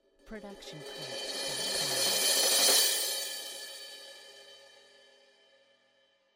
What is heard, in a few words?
crash cymbal 16 inch swell sticks medium; soundscrate